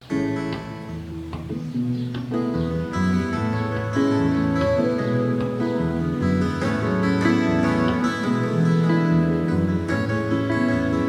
guitar

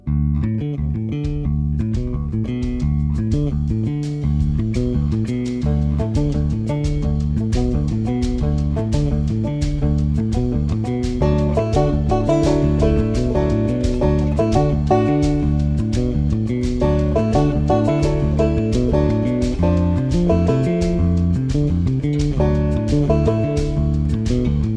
jingle

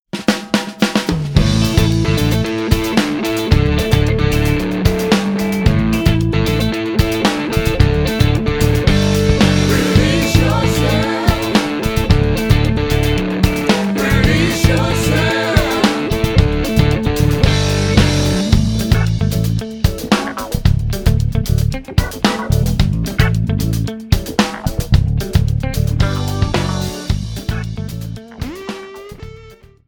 pulse

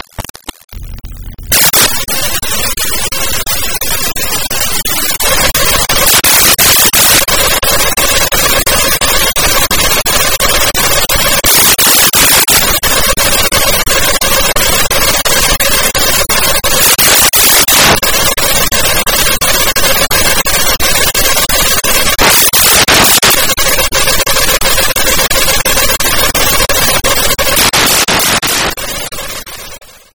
donkey beat

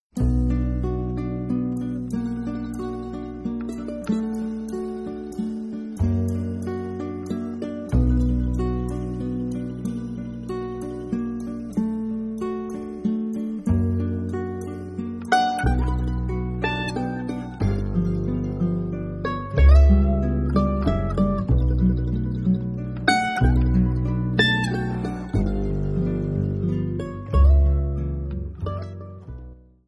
groove

